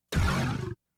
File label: synth roar growly ascend short bursts